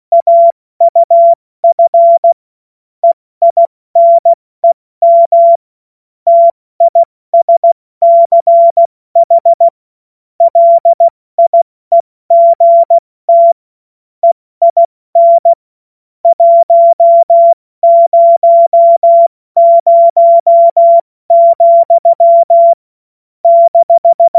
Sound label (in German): Witz, cw morsen; Tempo 70 F80